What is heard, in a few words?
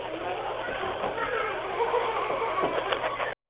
creeeeepy laugh; evp